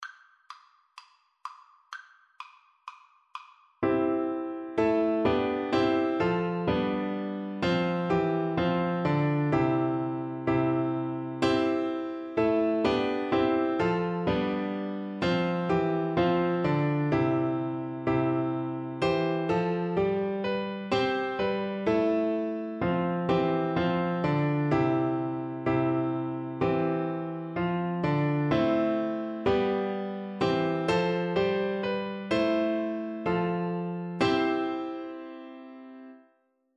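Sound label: good king, glockenspiel, Percussion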